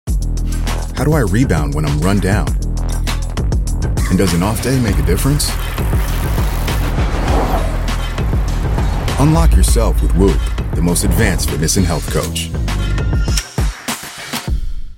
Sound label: whoop